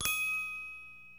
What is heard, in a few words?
Chime; Idle Champions of the Forgotten Realms